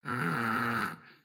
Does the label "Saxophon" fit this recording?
No